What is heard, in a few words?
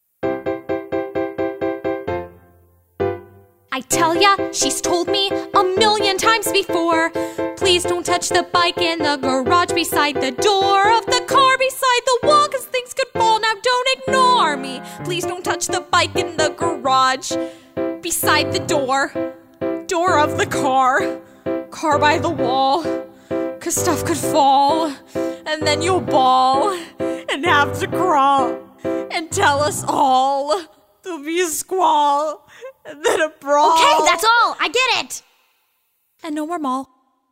VOCAL